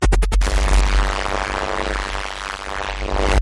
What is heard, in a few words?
Glitch Back Online